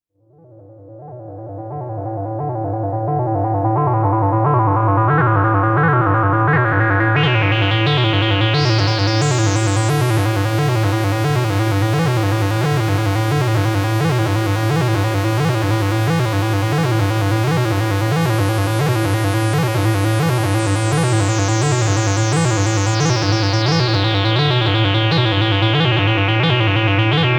ARP Filter

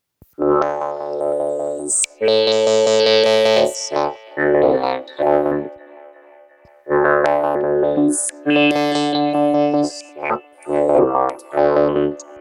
micro freak Shure headset vocoder sample and hold

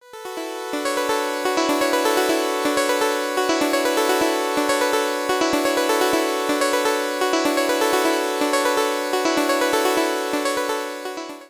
Clean; 1010music; fxbox

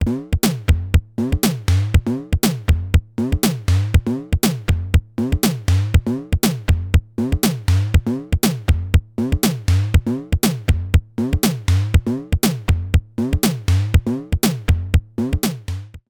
Simmons SDS 1000 Hi Trigger Sens

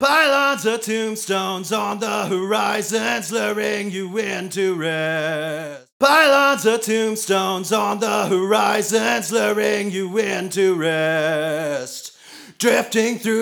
male vox dry wet

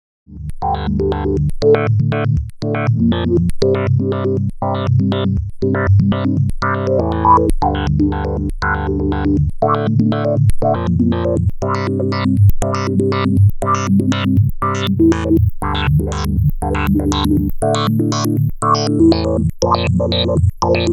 Sequencer Tri LFO Evolution; Morpheus

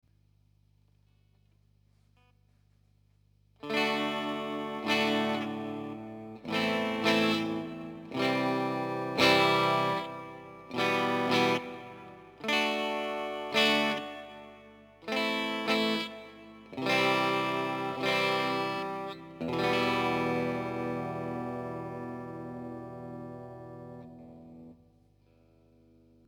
Clean; Zool LT L20R

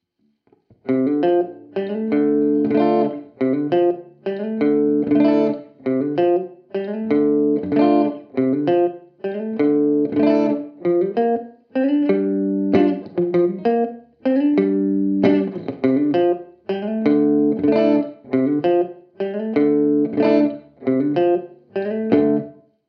bright

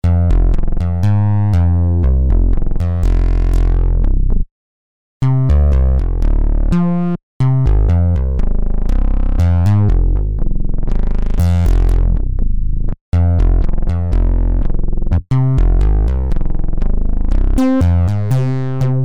FM309 Bass

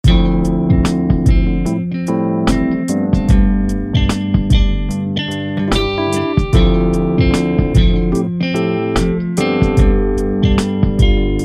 Strat Ballad Guitar; Realstrat; GuitarRig3

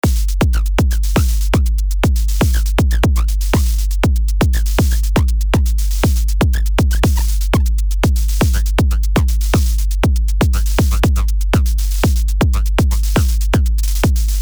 Drums

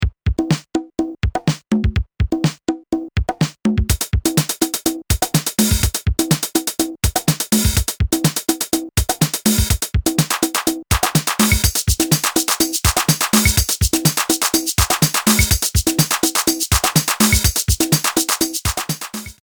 MFB Test Drums

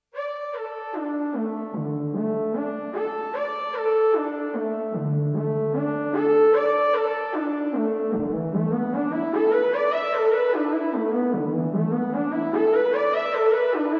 Arp Quadra Arpeggio Trill

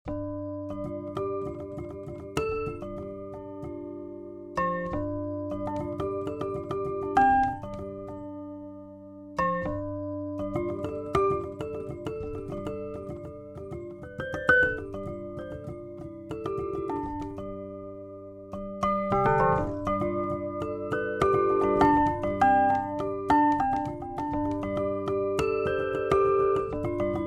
Rhodes Pre Piano

